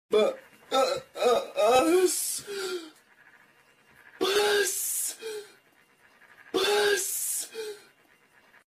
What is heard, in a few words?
bus